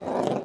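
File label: Ball Roll, pinball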